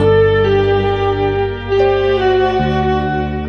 strings crestfallen, Sad; Loops

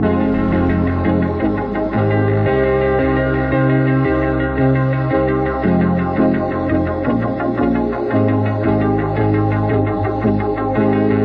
rushing waves, Loops Electronic

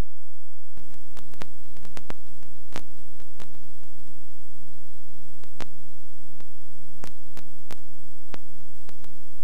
line noise, Vinyl; Misc Percussion

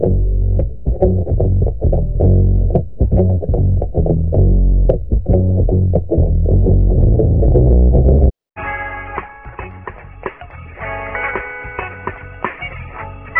guitar, Loops; Phonky

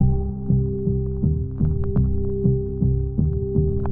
keys, phonky loops

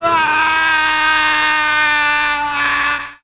scream

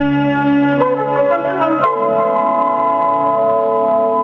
keys

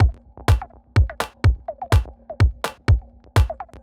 spacey, Drum loops Electro

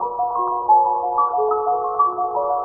piano situational anxiety, Sad; Loops

